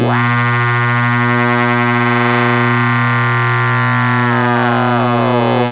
hard cycles, Synth

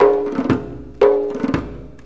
mideast roller, 117 bpm, drum loops and breaks

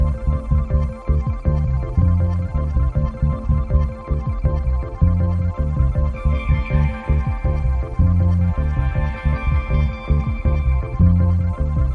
161 mellow moving bass and driving chords; SAMPLED MUSIC LOOPS

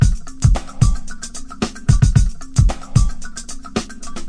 punchkin kombo mbira beat, 112 bpm

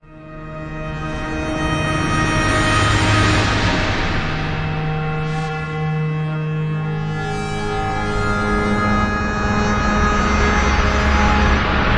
crescendo pseudo orchestra; Orchestral

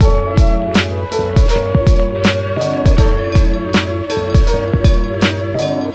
080 same hour smooth hiphop back

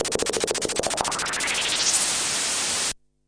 noise, Noise Sweeps

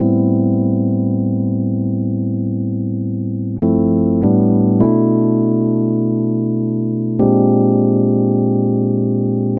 chill rhodes jazz drench 100 Em; KEYS ORGAN PIANO

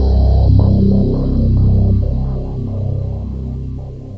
burbling low synth

